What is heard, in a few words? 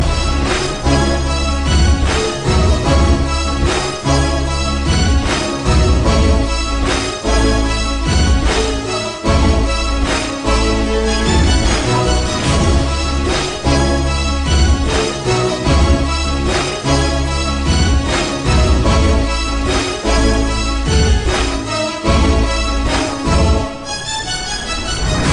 300 Violins